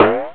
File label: Get POW; PowerPete Demo